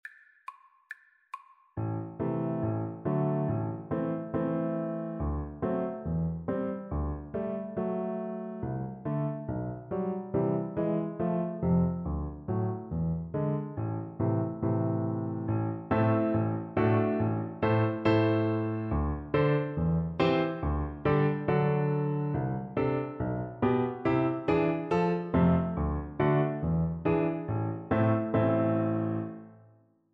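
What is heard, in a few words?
romanian folkdance VLC karaoke, Cello; bartok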